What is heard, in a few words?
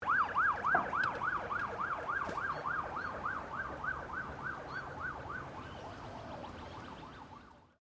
EMERGENCY VEHICLE UNKNOWN SIREN DISTANT, Emergency siren; Vehicles